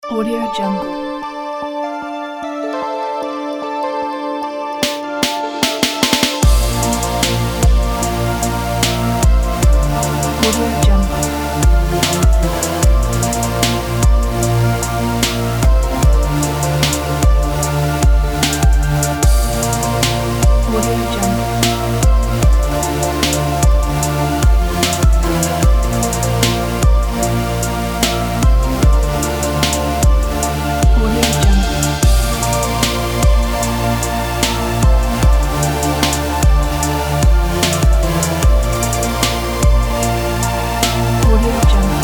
future bass

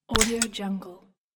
tick on

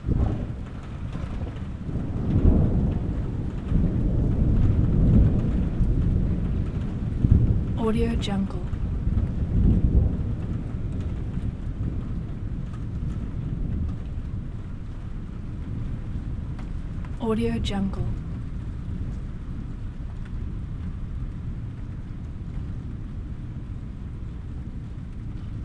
roll of thunder and rain

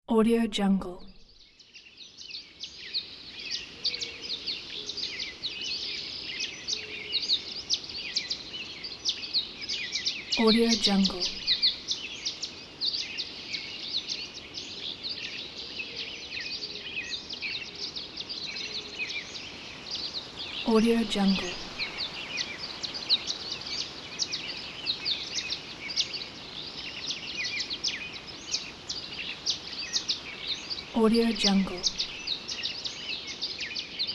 mountains ambience

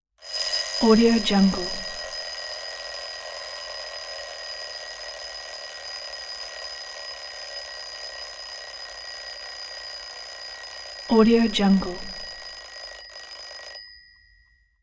mechanical clock ring

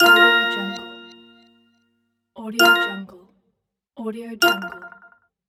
phone bleep

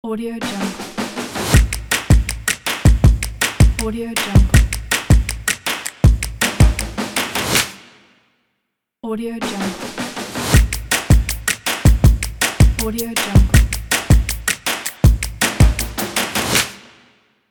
percussive logo

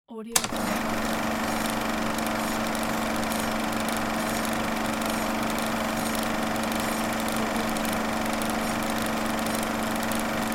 film projector reel

